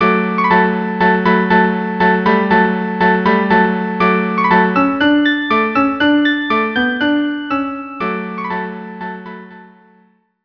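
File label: Dance violin cello; Dance of Tumblers; Snow Maid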